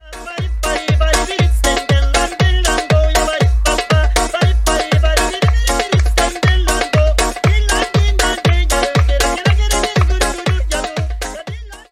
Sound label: paripapap music